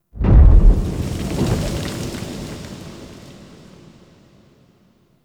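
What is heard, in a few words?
Distant Explosion Debris; Warframe; Ambience; Nokko Colony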